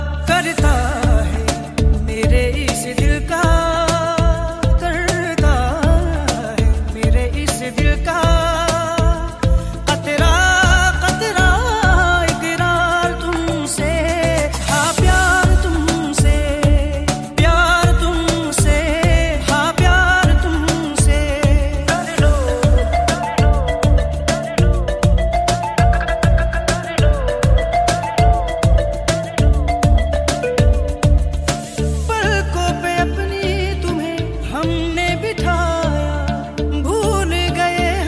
Hindi Ringtone

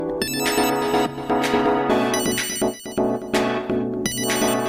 Oppo A5s Sms Ringtone

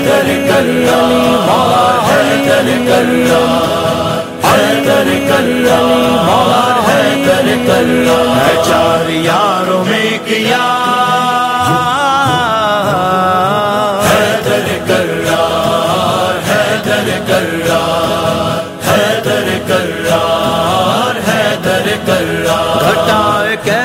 Islamic Ringtone

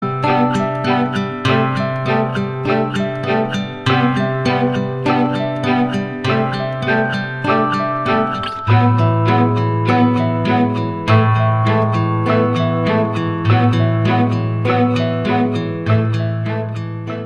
Samsung Official Ringtone

